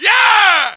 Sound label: YEAH; SOMC Family Forum